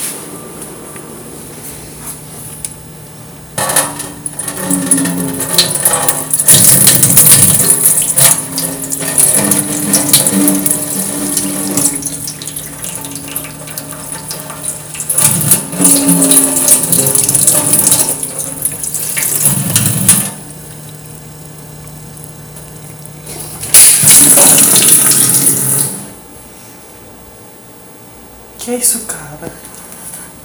som de agua

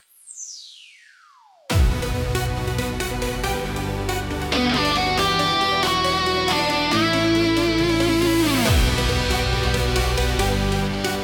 Três Patinhos Versão Brega

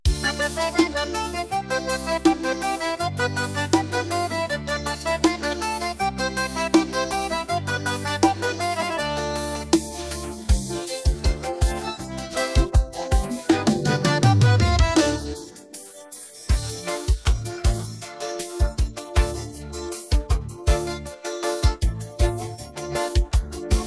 Versão Piseiro Instrumental

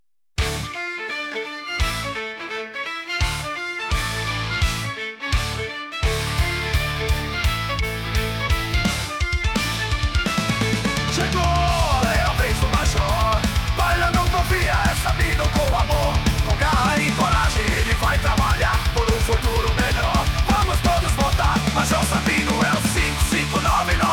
Versão Metal

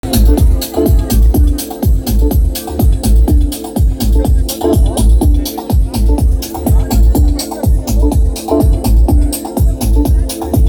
batida legal

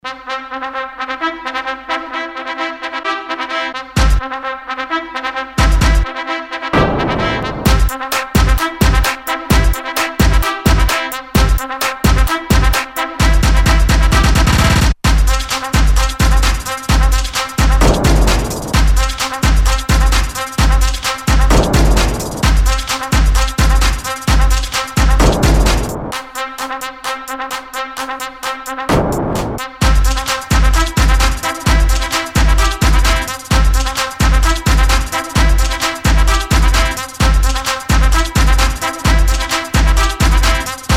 Batida de Funk - Corneta Pesadão

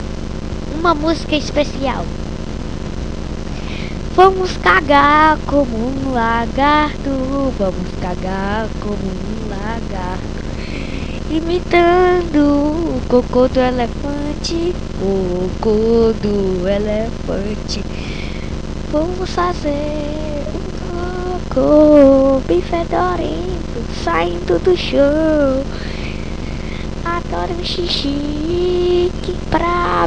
musica engraçada

